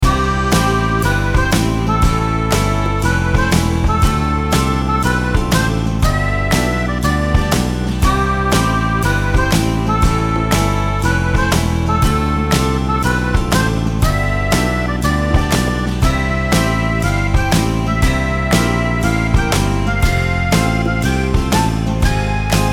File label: Beat le shuffle 120